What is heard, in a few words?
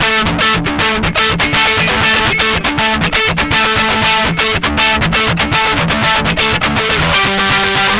120 Pop Guitar Alt A maj, Electric; Pop Rock; Electric 100-160 bpm; Pro Tools Essential Loops; Digidesign